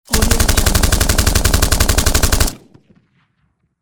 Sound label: machine gun